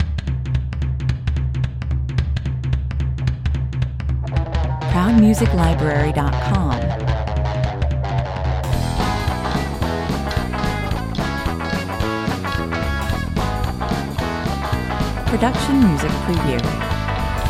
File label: rockabilly, 110 bpm